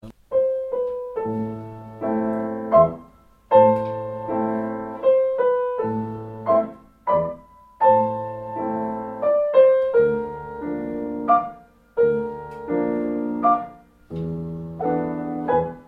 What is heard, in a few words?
Waltz; Kabalevsky